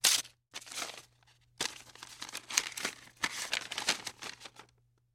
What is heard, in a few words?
Parchment Paper Rust; paper mvmt